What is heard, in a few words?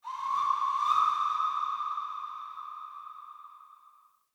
cave mysterious distant; Ambience; Shrine Cave; Midrath Island